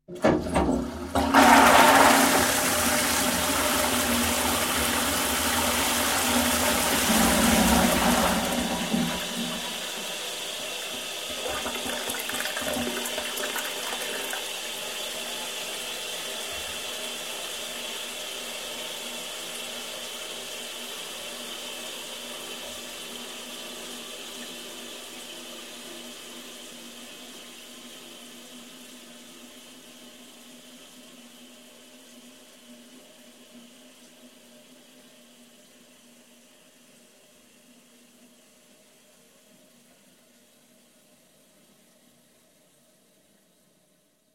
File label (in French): Chasse, Une chasse d'eau